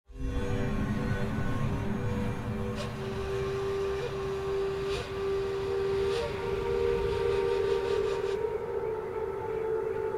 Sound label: Story Ambient; children of morta; Procedural Events